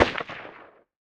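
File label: small far distance; Modern Warfare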